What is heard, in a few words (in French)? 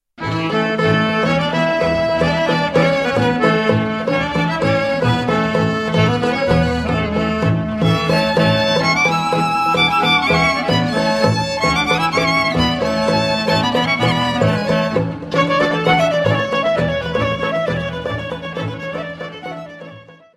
Rutschenitza - Bulgarie